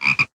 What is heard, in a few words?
Monster Frog type Attack